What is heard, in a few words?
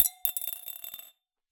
Drop In Glass, Medium Wine Glass